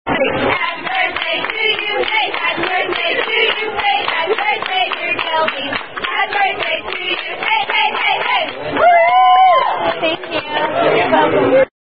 Birthday, Happy Birthday (Standard) Sung By Wait Staff; Chili's